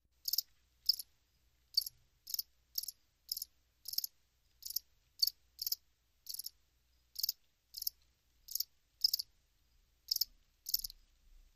Single Cricket Click

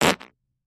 Close Up Fart; farts

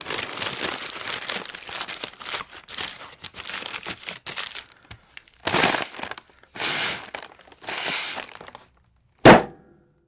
Paper Bag Blow Up Po

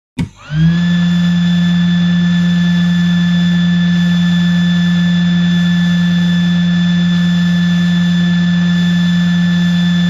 Electric Motor Light

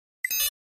Flash Beep Elect Dbl

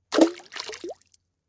Splash Sml Stone Plop

